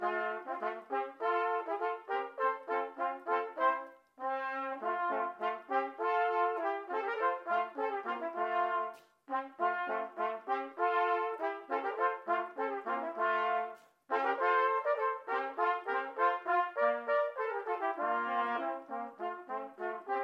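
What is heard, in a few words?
trio with percussion; the british grenadiers; toe excellence in chamber music bk 2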